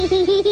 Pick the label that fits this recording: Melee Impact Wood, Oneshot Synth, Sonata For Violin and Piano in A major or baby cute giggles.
baby cute giggles